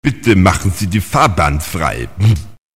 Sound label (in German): Jingels